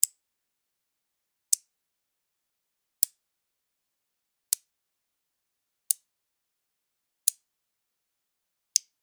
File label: magnet sticking clicks